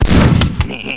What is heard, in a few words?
gun cock; weapons